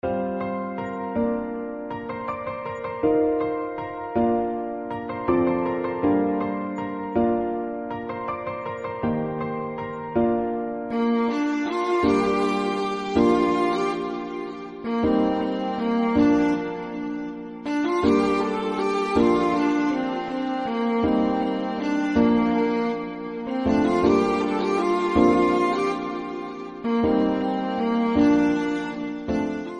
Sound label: Instrumental Ringtone